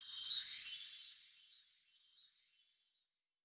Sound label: Puyokeshi; SE Staffroll (SE Staff00 Puyokeshi)